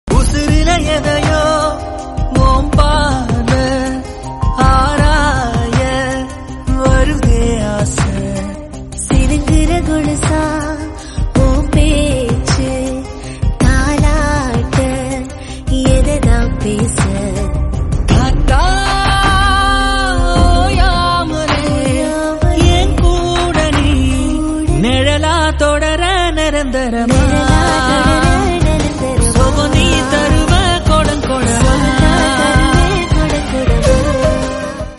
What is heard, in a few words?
song tamil